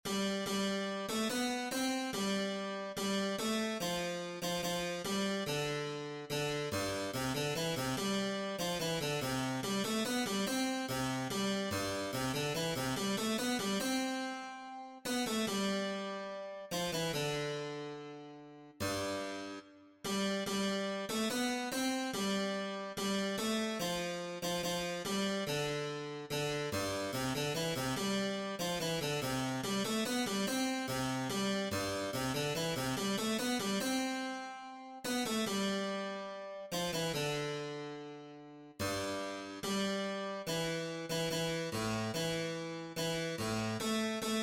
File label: Bassus